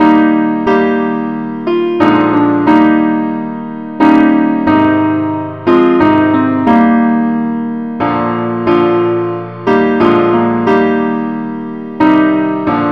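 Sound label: simple accompaniment key e flat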